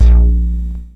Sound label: Electron Sidstation, Drum Machines